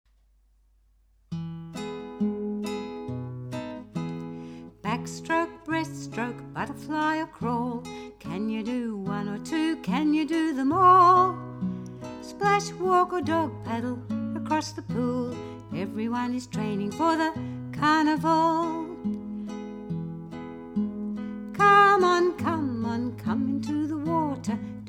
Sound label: Swim Song